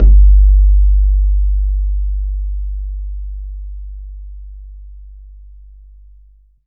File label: BAYAN, Drums Percussion